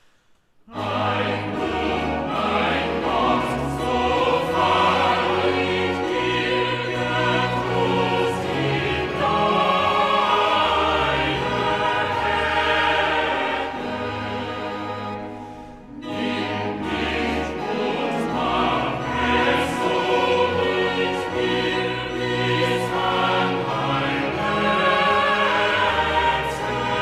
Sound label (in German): Choral; Epiphany; Cantata, BWV 65 'Sie werden aus Saba alle kommen'; VII. Choral 'Ei nun, mein Gott, so fall ich d; Bach; Weimar; Leipzig